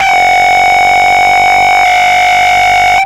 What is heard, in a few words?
TRACK BELL, Hard & Loud Techno; USB Soundscan vol.01; AKAI